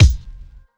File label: Kick; Ableton Live 12 Lite Core Library